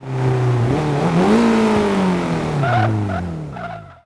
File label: throttle off, vehicles; gmod; tdmcars; mitsu evo x